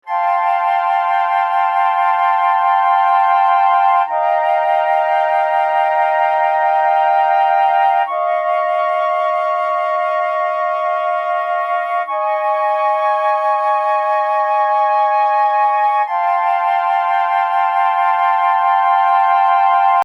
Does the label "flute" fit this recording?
Yes